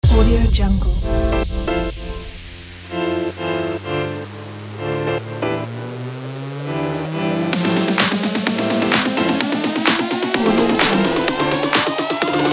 funky deep house fashion

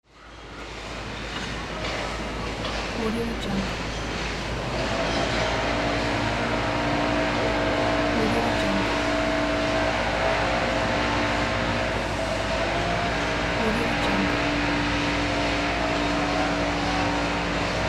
building

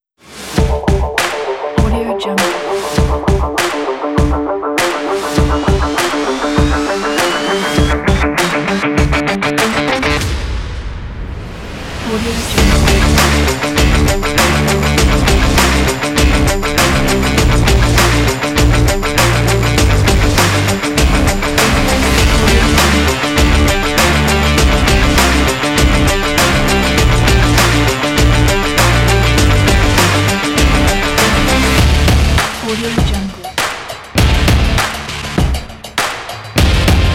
action sport rock

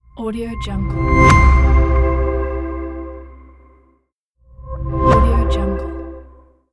logo reveal; terrasseproduction

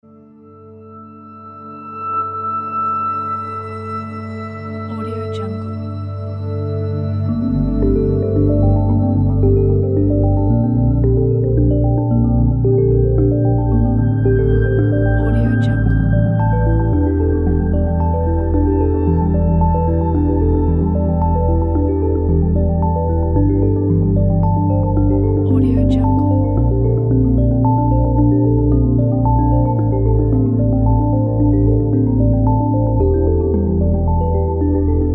scientific ambient

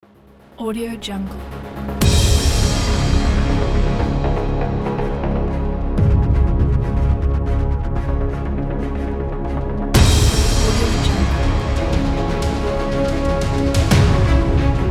trailer background

